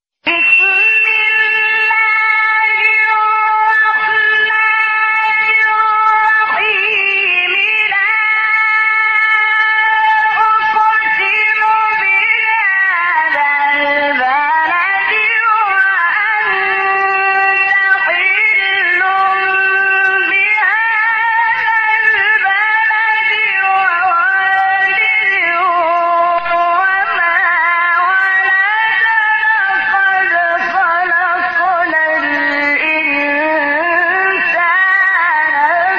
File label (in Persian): تلاوت زیبای از ابتدای سوره بلد; شحات محمد انور